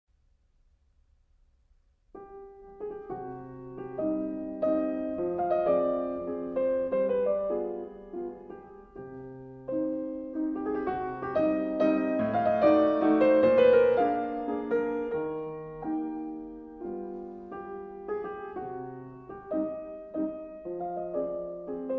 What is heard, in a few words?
Mazurka For Piano In C Minor; Glinka